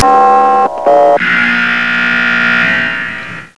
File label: e short tones